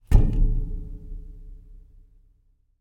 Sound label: metal airer rung hit release vibrate